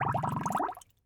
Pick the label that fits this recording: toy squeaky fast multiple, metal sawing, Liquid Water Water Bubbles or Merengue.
Liquid Water Water Bubbles